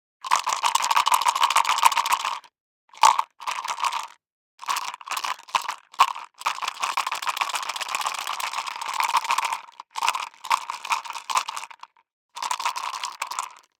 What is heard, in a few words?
DICE in Plastic Cup, Shake, 5 Five Dice; Samples; ArticulatedSounds; DICE Sfx Party (Extended)